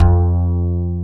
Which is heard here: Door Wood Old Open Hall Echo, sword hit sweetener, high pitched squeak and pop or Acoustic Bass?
Acoustic Bass